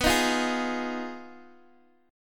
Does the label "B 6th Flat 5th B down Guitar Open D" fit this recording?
Yes